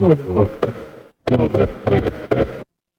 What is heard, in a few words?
mechanical engine